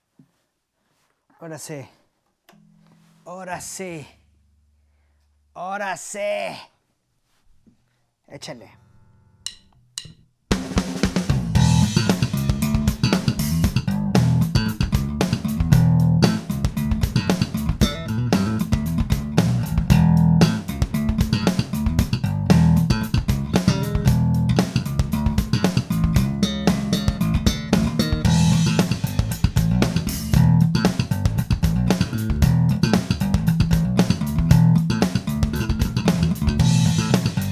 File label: intro bass; bonafe